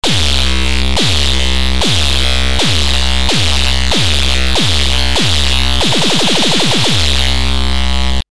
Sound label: synth